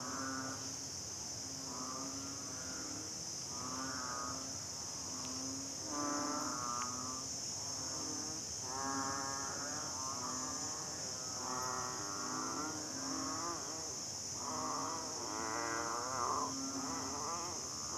Banded Bullfrog Chorus in Thunderstorm Lamma Island Hong Kong